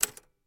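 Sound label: Typewriter Single Key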